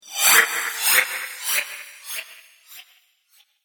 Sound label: Reverse Cymbal Whoosh